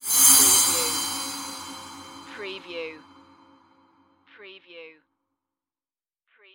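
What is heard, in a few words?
Production Element Hit Cymbal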